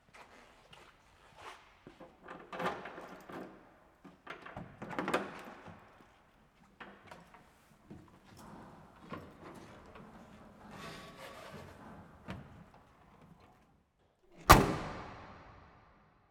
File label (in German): W50 Ein Aussteigen; Stadtmuseum Ludwigsfelde